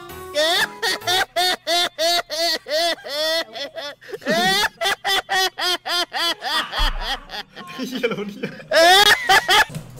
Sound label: lol hrk